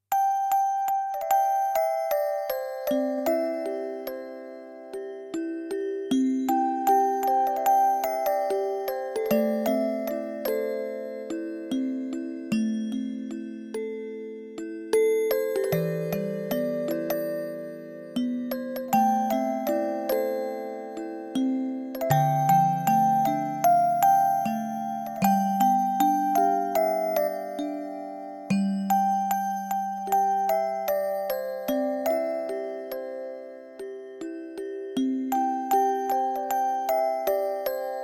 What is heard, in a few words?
Music Box